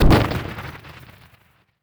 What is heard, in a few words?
Heavy Drop Impact Demolish Crash Destroy